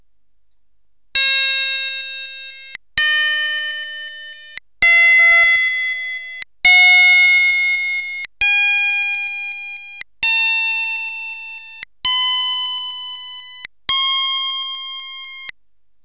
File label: flute